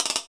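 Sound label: PER PROCU, Procussion, percussion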